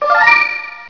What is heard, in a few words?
run